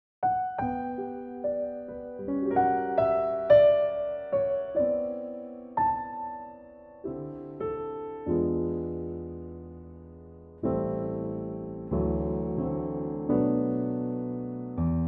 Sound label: G piano; Pianotrak; key - G - vocal range - A to A (optional B)